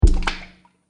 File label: Water Puddle Splat; Water Sound Effects; nature